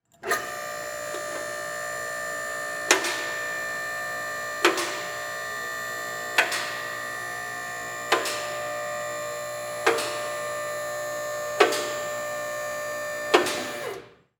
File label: Industry Hydraulic Pump Run Short